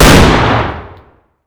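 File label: fire distant 50bmg rifle interior; gmod; arccw uc commons attachments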